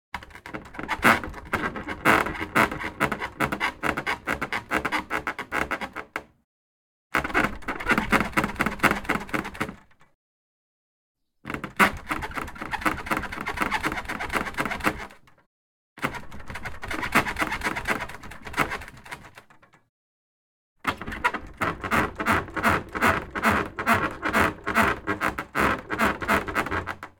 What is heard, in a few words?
DOOR Closet rattle squeak open close; Antisample; Shakes and Rattles